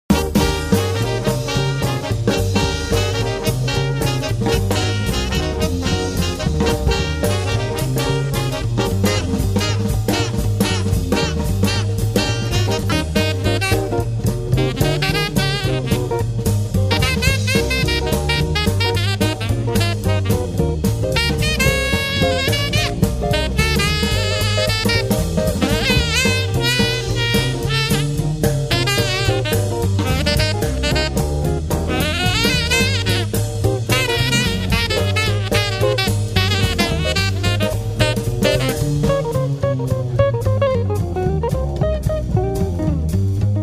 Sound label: Jazz Band; Blues